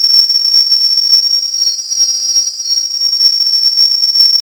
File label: brakes high